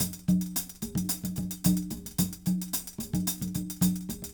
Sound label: 110 4 4 hh + con; Big Fish Audio - DOM